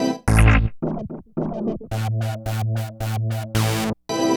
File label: Techno Synth